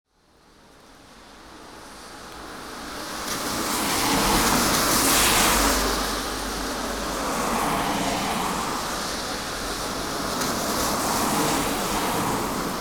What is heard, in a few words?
street traffic on a rainy day, Coquitlam BC, Canada